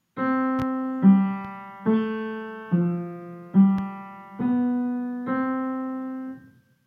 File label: Walking tune framework